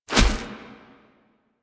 Wolf Step; Animals; Warframe; Quests; Duviri

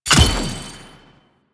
UI Galvanized Mod Install; DE; Warframe; Mods

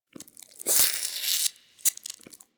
Wheeze Close; Ambience; DE; Warframe; Infested Eris

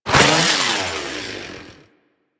Hand Saw Whoosh

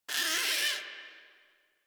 Forest Rodent Vocal Angry Short; Warframe

